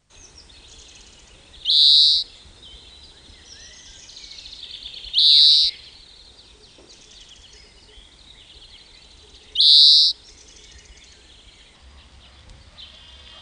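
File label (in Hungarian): Zöldike; Madarak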